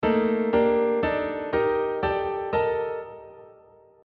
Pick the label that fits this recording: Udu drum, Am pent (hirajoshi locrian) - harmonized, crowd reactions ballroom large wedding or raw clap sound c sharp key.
Am pent (hirajoshi locrian) - harmonized